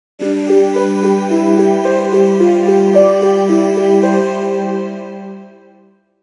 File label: alert piano; Nokia; 8800 Sirocco Edition